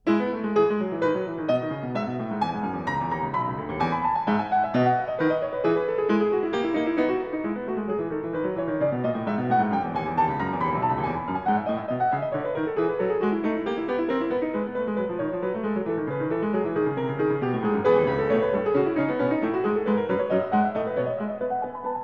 Piano Sonata No.30 in E major, Op.109 Variation III- Allegro vivace; Beethoven; The Piano - Legendary Recordings